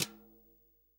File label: TOM, REAL DRUMS, SWING KIT